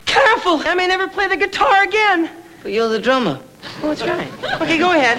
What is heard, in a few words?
guitar